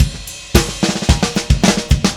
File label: Drums Fill, Indie Rock; Session 01 - Brighton; Sonic Foundry (Sony Creative Software); Indie Rock Anthems